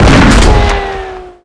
pistol; outlaws

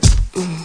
drumm

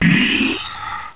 slide

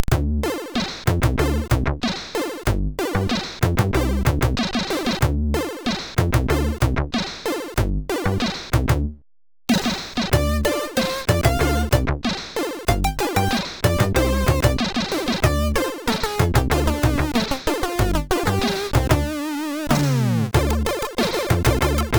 Jazz Vibe; SIDTunes

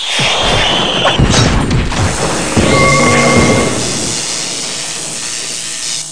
big melee

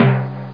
(D#7) Timpani; X-Wing Demo; X-Wing Patches

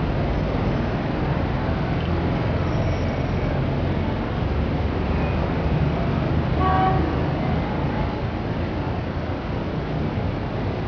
City Noise; WARLOCKSNDS

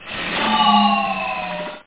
Zoomy weird thing; AlgoMusic

